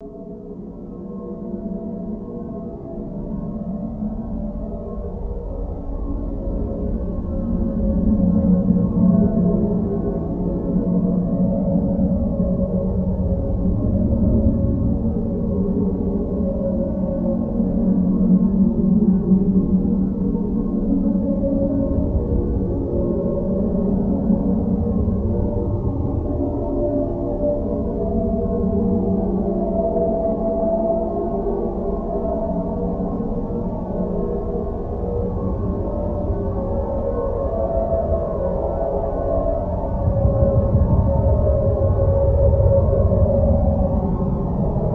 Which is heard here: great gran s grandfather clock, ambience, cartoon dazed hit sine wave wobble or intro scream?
ambience